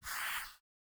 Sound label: Soft Slide Medium